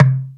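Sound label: R8-PRC, R8 percussion; E-Lab; X Static Goldmine 1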